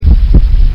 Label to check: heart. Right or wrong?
Right